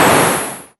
fire trap, traps hazards; freepixel

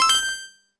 match found; Cards & Board

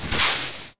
torch light; Puzzle; puzzle mechanics

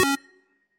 quest complete; Game Events

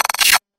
harvest crop; Farming

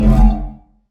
abyss suck; Sfx; freepixel; Tilter

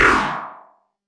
portal enter; Sfx; freepixel; Tilter

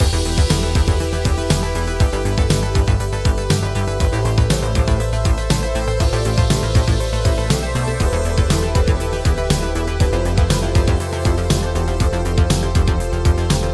stage two music; freepixel; Wordacted

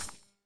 hit ice; Combat; combat impacts

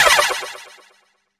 summon; Magic; magic spells

